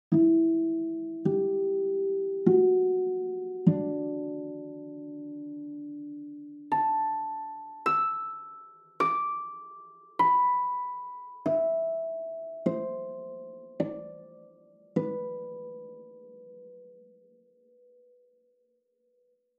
ARPA flazolety

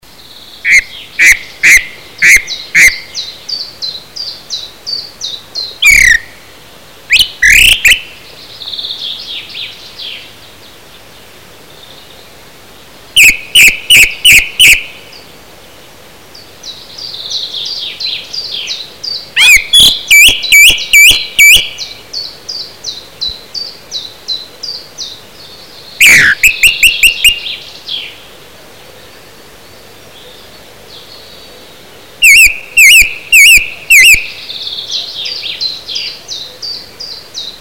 Drozd zpevny

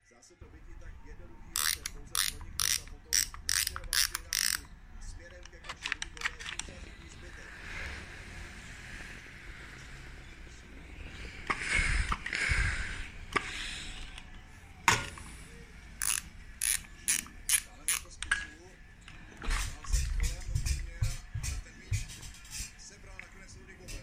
Ruch

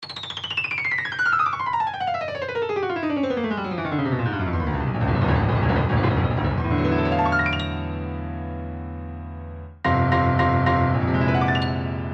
Klavir

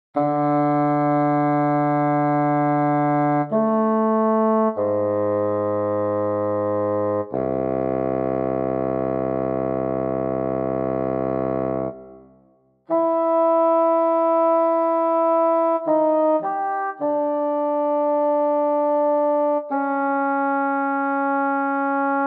FAGOTTO bez vibrata

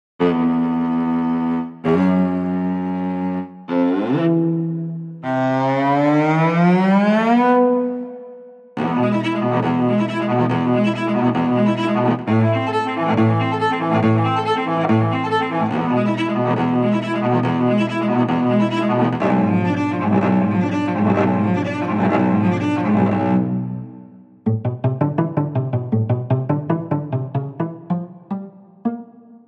VCELO solo celek

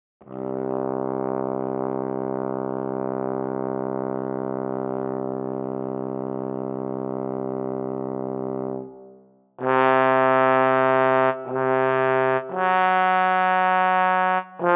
TROMBONE bez vibrata

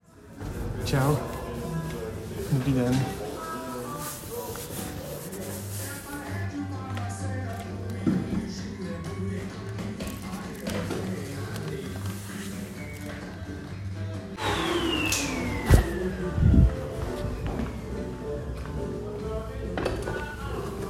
situace

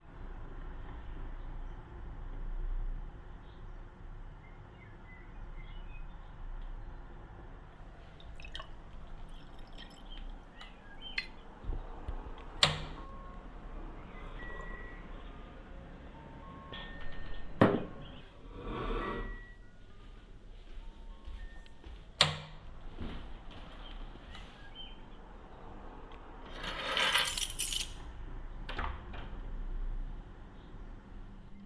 ruch

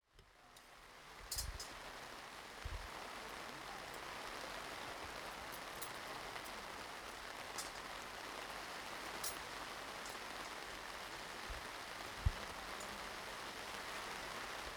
ruchova momentka

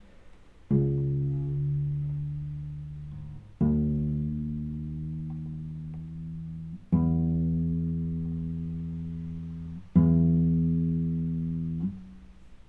ruch

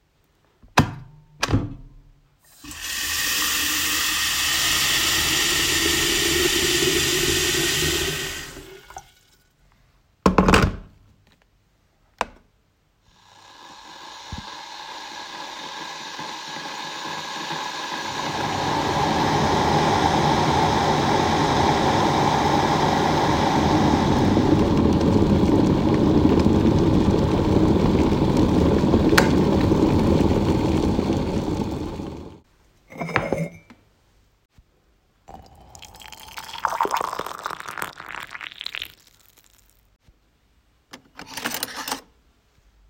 ruch

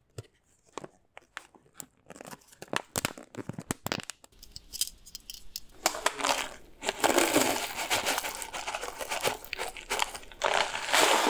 ruch vareni caje

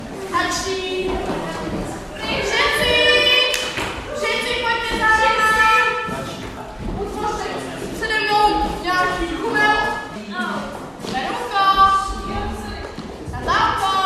situace

